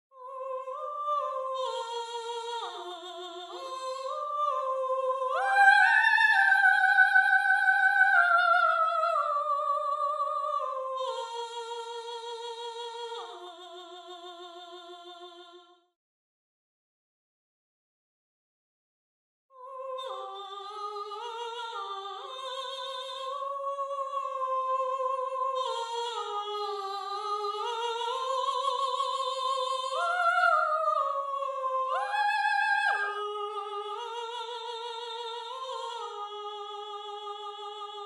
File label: Soprano